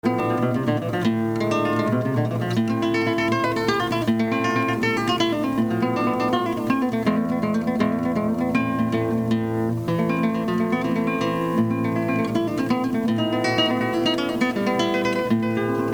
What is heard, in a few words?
gigue, classical guitar